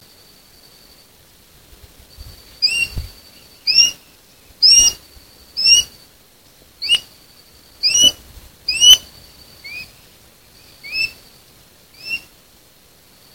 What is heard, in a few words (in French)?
epervier brun